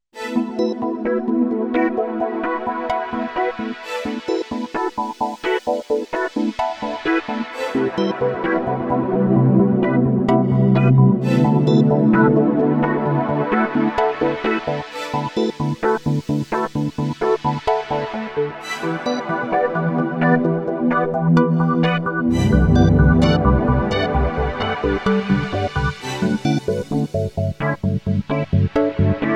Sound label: Wave Sequencer